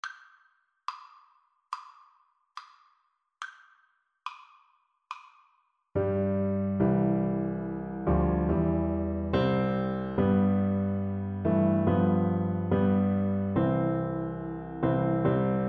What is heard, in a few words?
auld lang syne BN, Bassoon